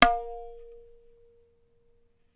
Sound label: tabla; Dirt-Samples